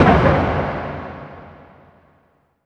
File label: JETTISON, PERC; NorthStar - The Wizard VOL-2; TSAR PERC